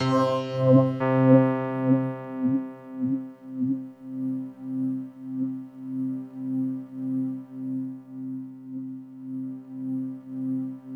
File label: C3, Synth Line; 90 s Sample CDs; NiceBeats; SynthLine VOL-17